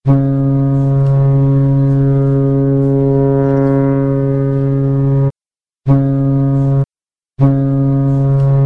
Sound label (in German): lang kurz lang kurz, schall